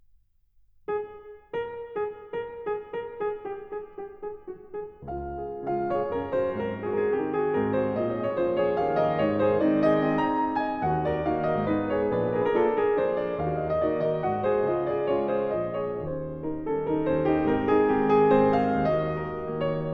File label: Trois Nouvelles Etudes. II. D flat major, Piano; Chopin; Preludes